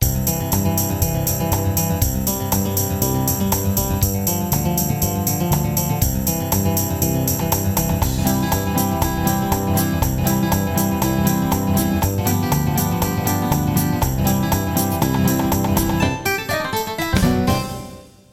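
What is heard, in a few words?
Country 2 Step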